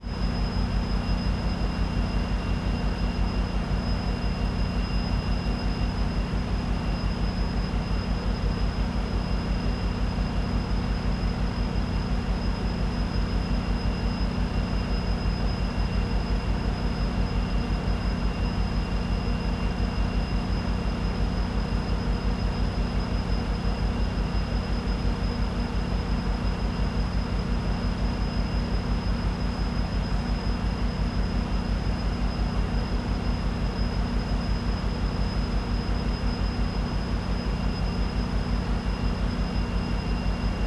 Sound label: Train, Locomotive, Diesel-Electric, MP40PH-3C, Distant, Idle, Whine; MPI; Vehicles Passenger Trains